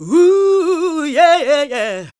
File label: GOSP. Singin